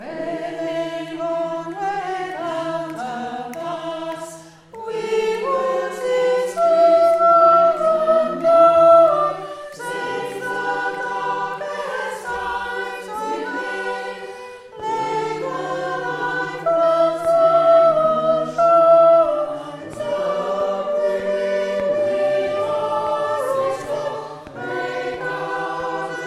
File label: womens Song Sop